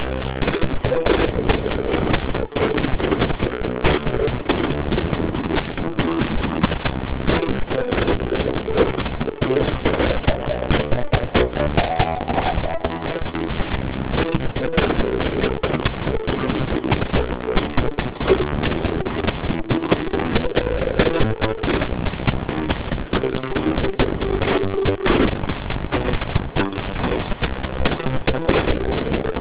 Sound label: bossa beat